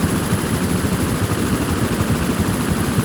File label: tunneler rotor stereo